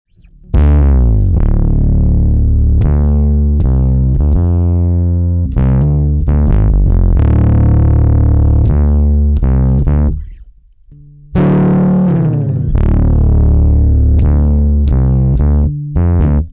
Sansamp neutral Char left higain

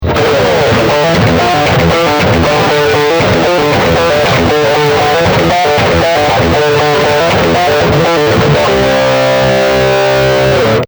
Distortion

